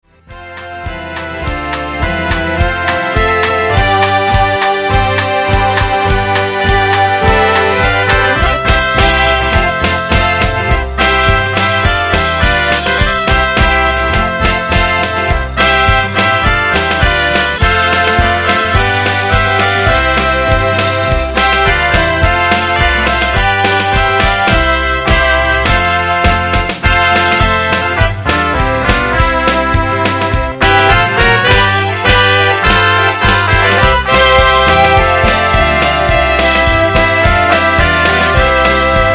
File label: POLISH GIRL POLKA INSTR